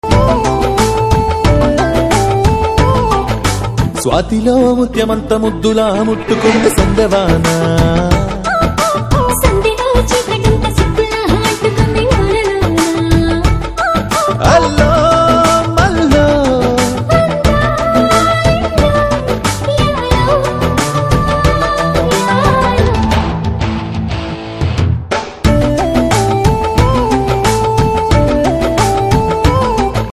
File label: Ringtone